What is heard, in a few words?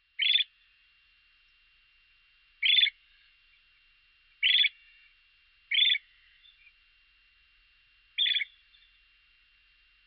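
long-billed dowitcher, matsuzaka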